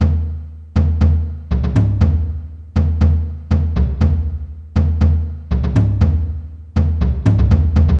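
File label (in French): tambour irlandais; MOBICLIC 14; europe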